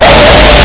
loud jumpscare

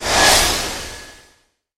missile

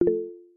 message sound

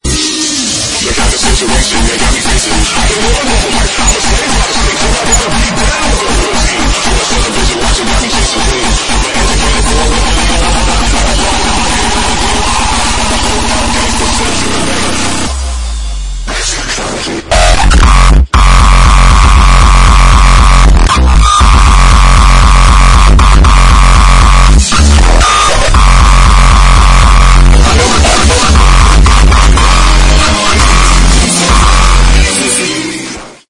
basskick

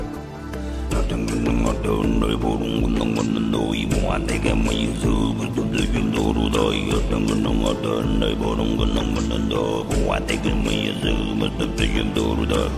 singing

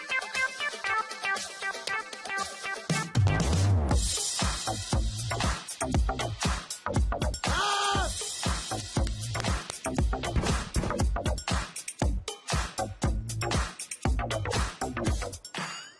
jingle; morning glory

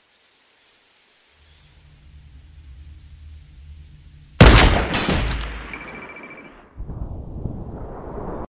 moving headshot snipe; fortnite

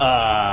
uuhhhh

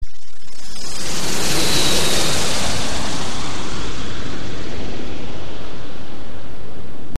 airplane surround

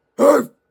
woof

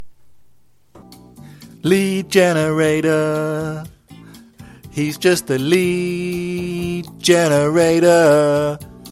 lead; generator v2

